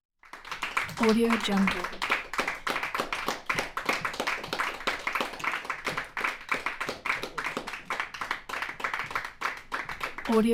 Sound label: small group applause